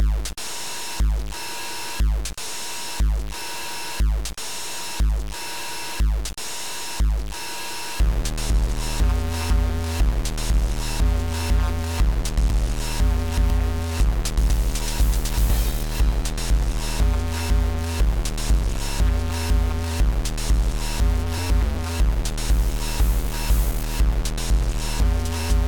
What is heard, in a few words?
saw doom